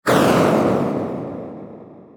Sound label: Explosion; Touchscreen Interactive Multi Map Wall Console